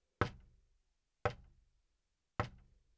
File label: Knock On Wood Slow